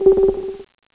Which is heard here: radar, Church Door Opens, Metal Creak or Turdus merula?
radar